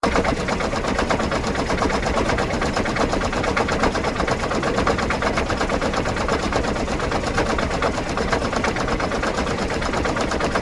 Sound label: tank engine; factorio; Factorio 0.14.21; fight